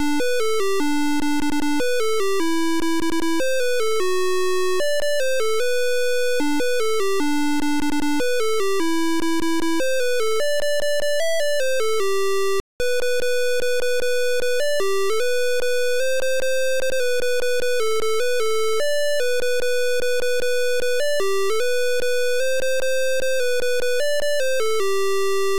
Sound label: MELODY